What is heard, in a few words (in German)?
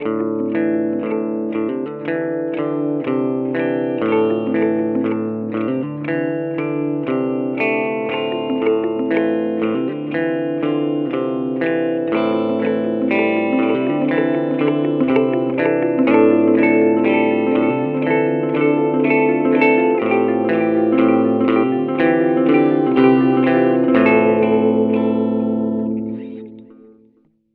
Yamaha THR30 II Modern Clean mit Hall